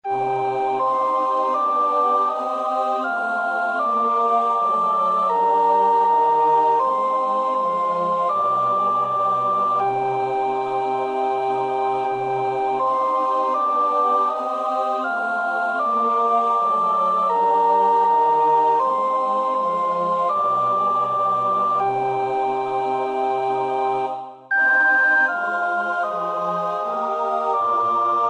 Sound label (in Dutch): sopraan; Bach (Johann Sebastiaan); BWV 073; Das ist des Vaters Wille